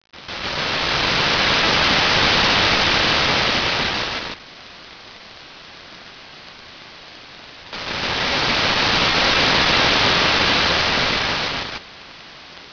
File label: ocean